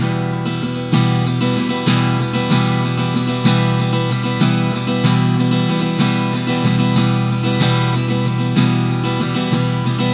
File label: Pop Ac-Guitar Strum Emin; Pop Rock; Acoustic Guitar; Pro Tools Essential Loops; Digidesign